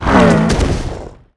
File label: Mutantile death; SwampOfDarkness